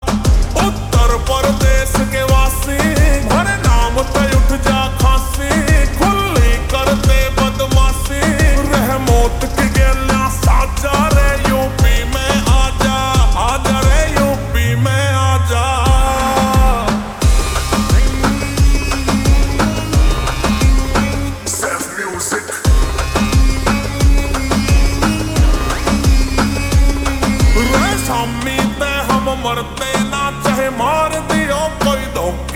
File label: Ringtone Slowed Reverb